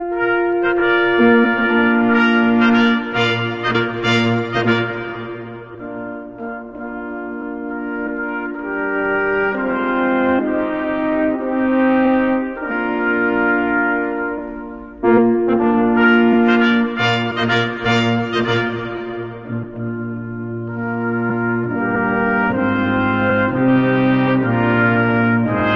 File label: brass ensemble; Hodie, Christus Natus Est; Christmas